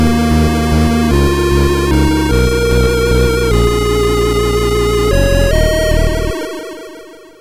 Jingle (Fast)

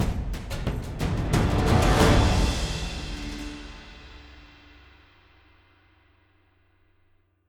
Combat General Intro; The Witcher 3 Wild Hunt; Toussaint; General Hub

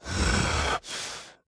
Walk Cycle Burns; The Town

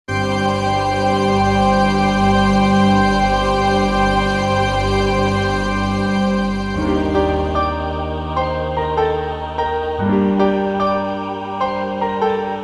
Instrumental Version; Kingdom Hearts

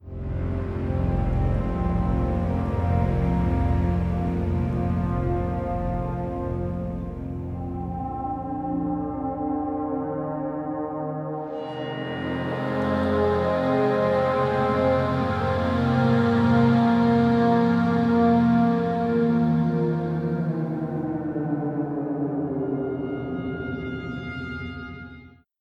Power Music; Chronicles of Riddick Assault on Dark Athena

